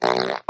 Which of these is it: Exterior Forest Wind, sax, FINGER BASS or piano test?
sax